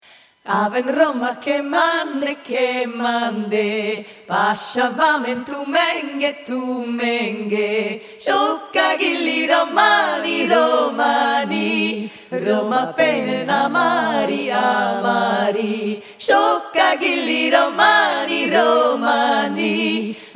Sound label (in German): 3stimmig; aven roma